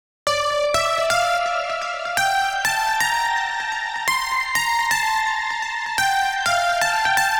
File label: Mono Piano, 126, Dm